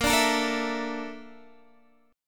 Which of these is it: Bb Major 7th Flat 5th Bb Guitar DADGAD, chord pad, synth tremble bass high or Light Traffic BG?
Bb Major 7th Flat 5th Bb Guitar DADGAD